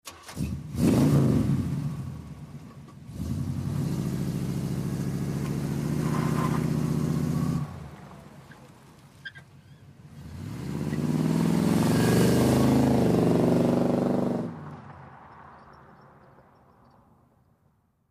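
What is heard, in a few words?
1958 Chevy Impala Start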